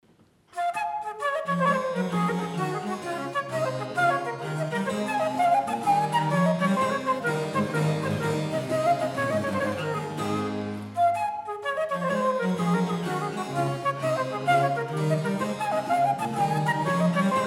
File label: suite D-dur Gigue from concert; Hottetere; op 5